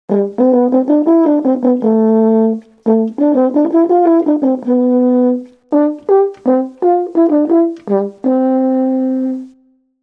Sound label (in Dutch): blaasinstrumenten hoorn